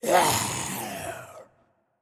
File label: Hit Summon monster